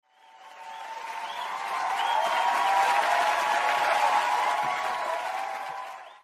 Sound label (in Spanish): publico aplaudiendo